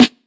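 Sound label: classic hip hop snare; Classic Hip Hop Mega Kit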